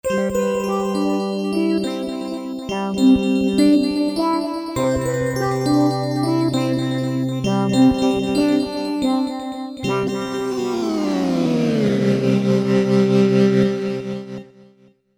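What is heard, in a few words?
Vox electric piano Organ, V-Synth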